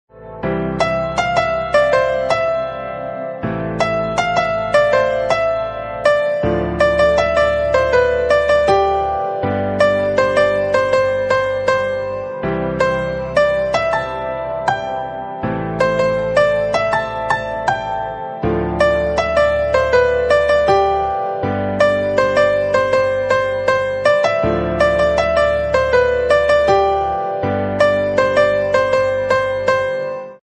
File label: bgm background music theme; rudraksh